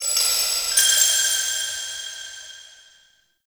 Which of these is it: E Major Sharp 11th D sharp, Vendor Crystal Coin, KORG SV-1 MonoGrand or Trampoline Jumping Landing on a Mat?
Vendor Crystal Coin